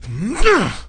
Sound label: grunt; kingpin